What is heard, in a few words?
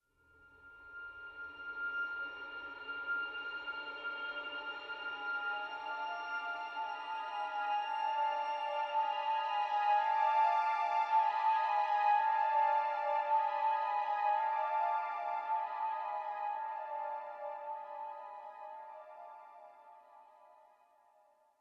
Ruins Front